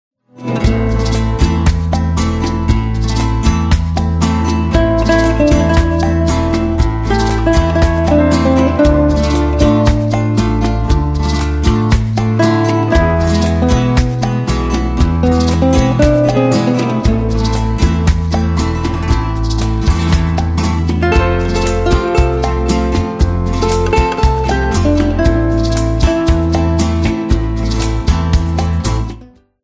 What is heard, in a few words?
guitar